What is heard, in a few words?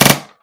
NERF GUN SHOT TRIPLE